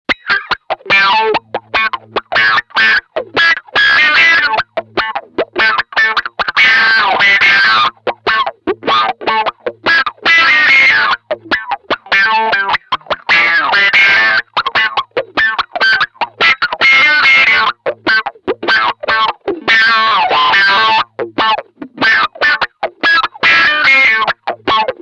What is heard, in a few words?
Neck Pickup